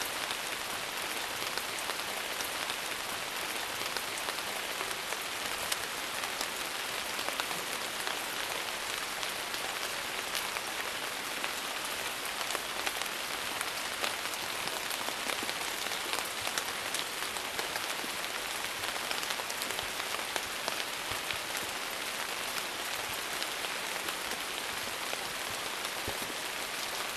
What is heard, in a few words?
Rain Sampling Thunderstorm Rain Sound Summer Rain